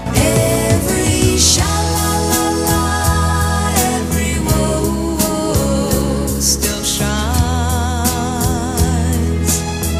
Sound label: Song